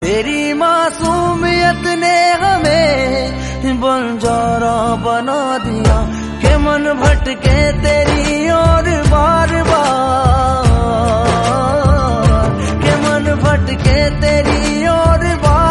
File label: hindi ringtone